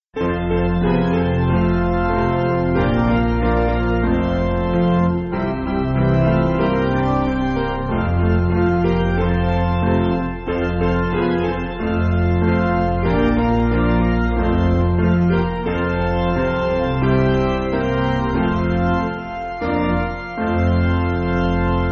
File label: Piano; Deuwch Gyda Mi